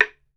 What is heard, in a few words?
BAMBOO, World Assortment